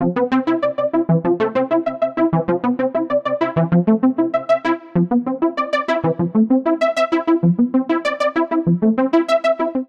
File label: A Minor 97 bpm; Accompaniment; Tonal; MIDI Clips; Flight Time; Drive and Glow; Ableton; Factory Packs